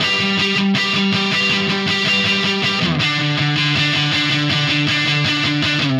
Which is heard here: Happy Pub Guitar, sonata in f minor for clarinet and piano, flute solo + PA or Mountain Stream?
Happy Pub Guitar